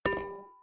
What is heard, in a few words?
notify